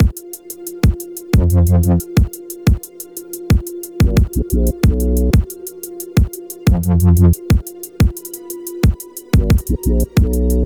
Endless Chill Beat; horatio goes snowboarding ps4 ps5 switch windows xbox one xbox series xs gamerip 2021

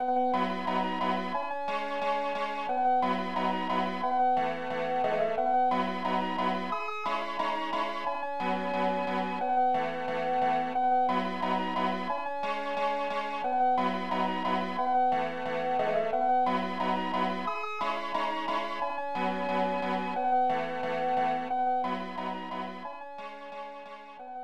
Conversation

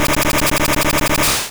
Razor Wind Hit

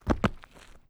tom fall

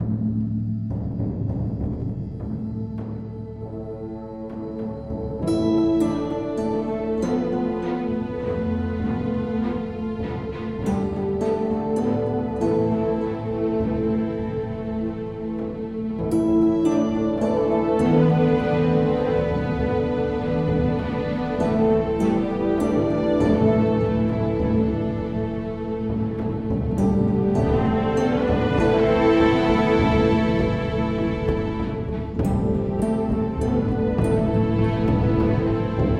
Loop Neutral

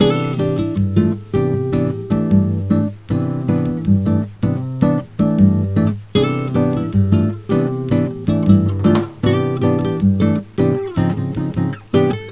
BOSSA; Kid Pix Studio Deluxe

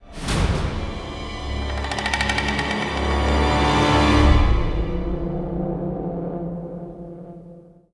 Fight Theme Ambient Start

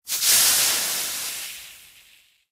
Poison Explosion

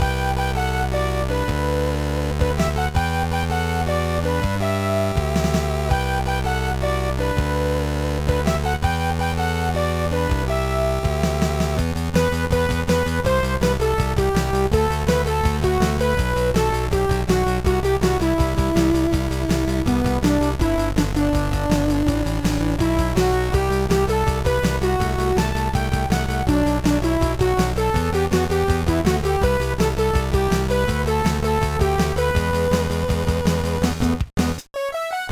Max Battle; bomberman tournament gba

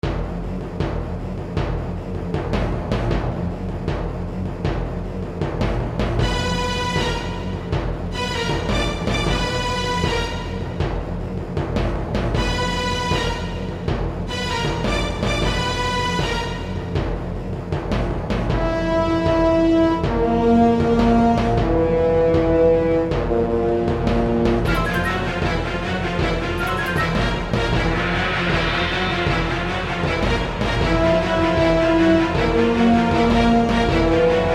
scary; Warhammer: Dark Omen

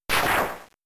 Thunder Shock; Pokemon; Gen 3; attack moves; RSE

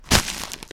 Attack Eat

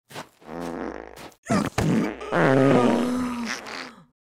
fart LONG; Goddess of Trampling

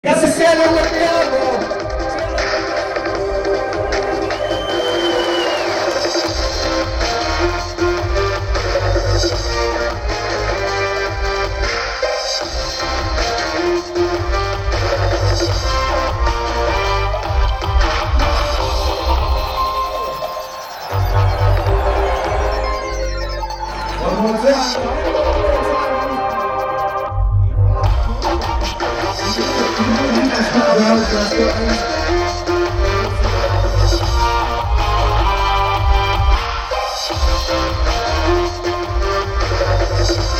House; Need for Speed Shift 2 Unleashed; Slightly Mad Studios; Drift 03 House Step 01

